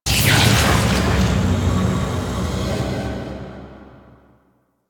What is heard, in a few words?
Echo Pickup; Warframe; Entrati